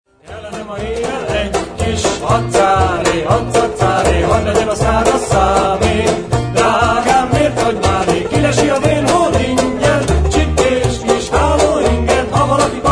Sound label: banjo